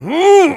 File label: taunt flip exert